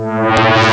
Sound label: alarm; Amiga Soundtracker Sample Packs